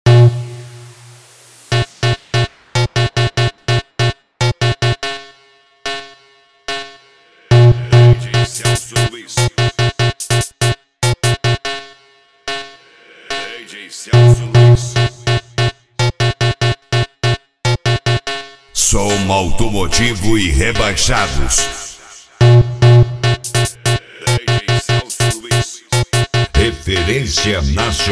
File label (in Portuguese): som automotivo; rebaixados 2023